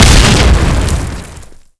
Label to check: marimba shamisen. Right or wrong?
Wrong